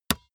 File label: basketball dribble tap hard; sport freizeit